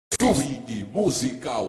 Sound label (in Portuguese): vinheta da banda